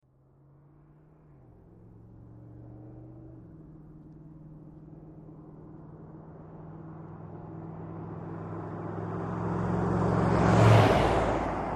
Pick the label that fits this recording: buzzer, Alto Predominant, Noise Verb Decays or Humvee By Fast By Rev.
Humvee By Fast By Rev